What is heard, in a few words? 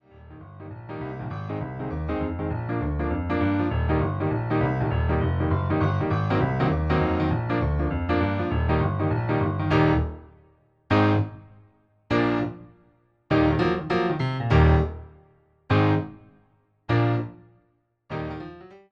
instrumental; bez linii